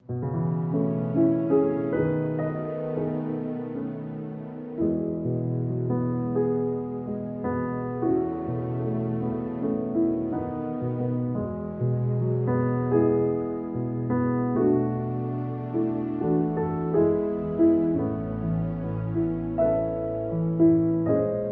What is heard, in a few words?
With Strings